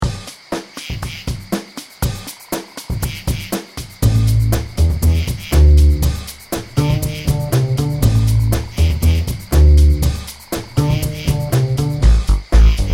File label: Zombie Music, Halloween; HalloweenPack